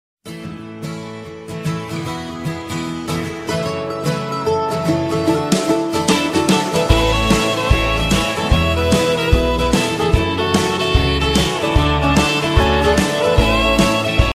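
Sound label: country music ringtone; kantri muzyka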